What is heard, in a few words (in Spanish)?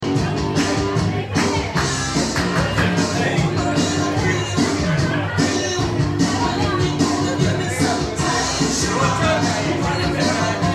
bares pub tapeo club; av pellegrini; barcelona